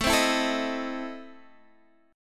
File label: E Major 7th Suspended 4th Sharp 5th, A